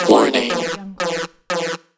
warning alarm

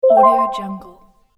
retro 90s video game confirmation menu sound

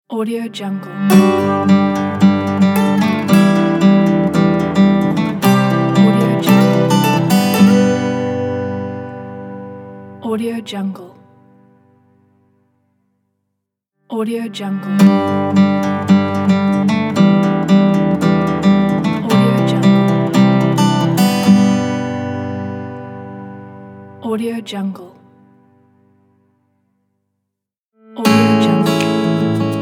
acoustic guitar logo pack

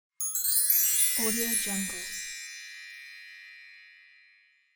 fairy glitter; volkovsound

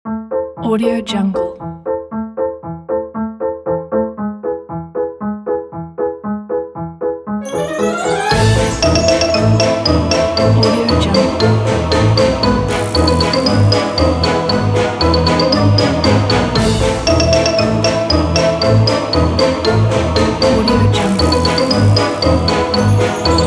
spooky halloween fun

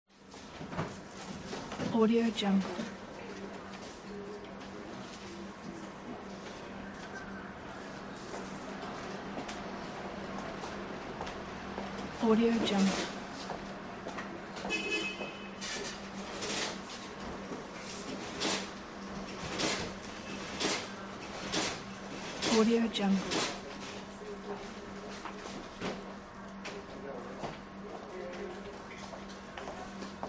post office ambience

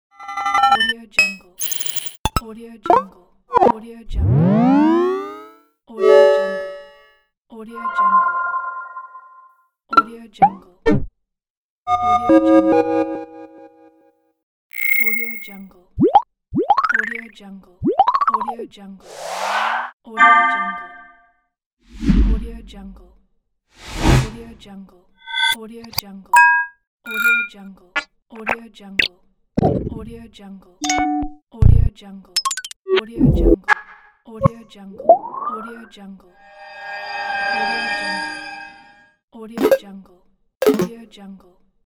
tech infographic sounds pack

